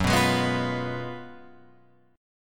Gb Major 7th Suspended 2nd Suspended 4th Gb down Guitar Open F; GbM7sus2sus4 strummed; JGuitar